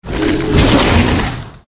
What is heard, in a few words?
Bike